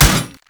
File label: Hammer Impact Armor Damage; Weapon Impacts; Player; Combat; Tuvalkane Hammer